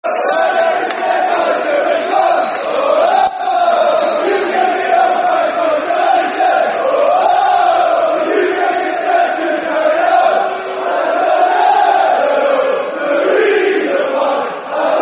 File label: Football Chant (Soccer Songs); Port Vale Pvfc